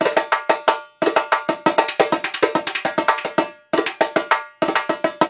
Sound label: Repinique